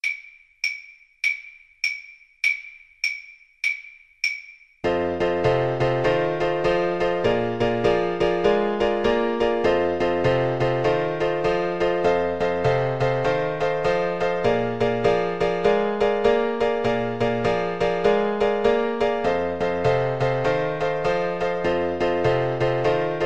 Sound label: style, piano